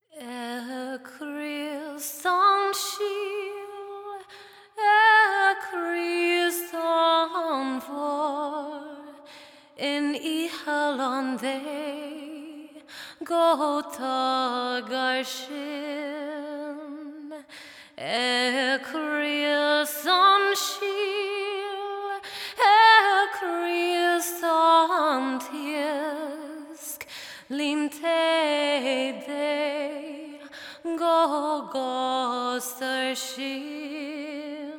female Irish Gaelic and English classical singing compilation